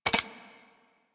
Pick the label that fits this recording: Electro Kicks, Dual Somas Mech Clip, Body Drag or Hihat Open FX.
Dual Somas Mech Clip